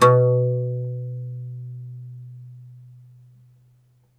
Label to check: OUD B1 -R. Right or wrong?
Right